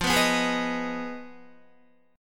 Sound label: F Minor 7th Flat 5th F down Guitar; Fm7b5 strummed